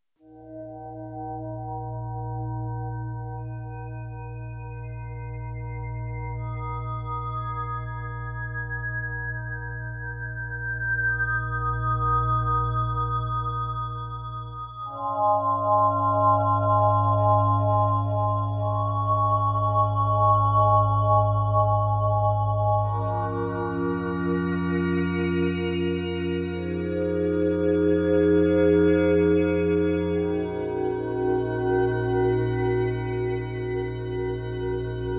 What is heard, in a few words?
Glockphone